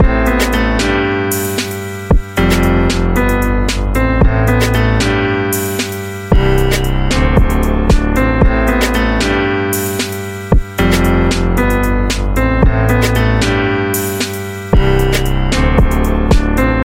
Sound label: nokia rhythm ringtone